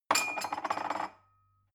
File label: glass shelve wobble chink